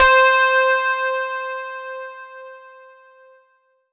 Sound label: Yamaha EX5 Georgia C5